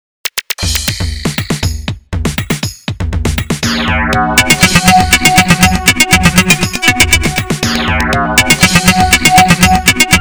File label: Nokia rave, ringtons to Nokia